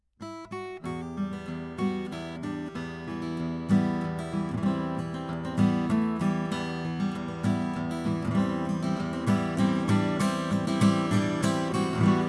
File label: studio indian